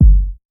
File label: kick g key, Royality free kickdrum tuned to the G note. Loudest frequency: 71Hz; drum single hits one shots